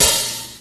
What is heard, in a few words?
soft open high hat a key